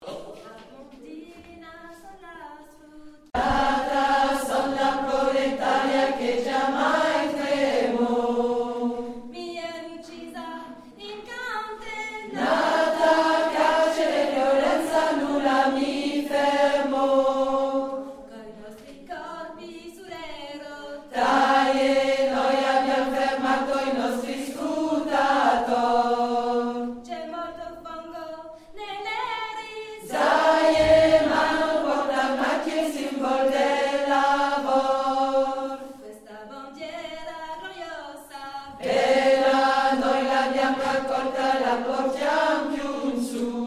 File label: Alto